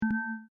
Beep Electronic Double Beep, User interface feedback sounds; Multimedia; Beeps